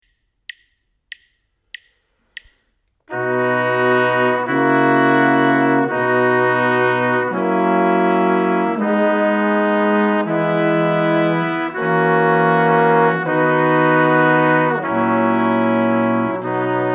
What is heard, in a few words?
harmonic dictation